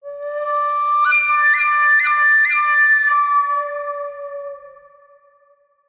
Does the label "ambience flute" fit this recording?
Yes